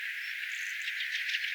rastaslinnun aani saaressa aanitetty

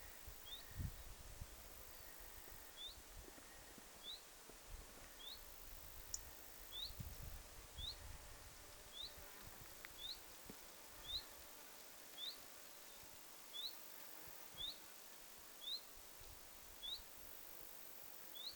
tiltalttilintu

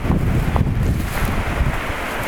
voisiko olla tundrasirrin lentoaani sama

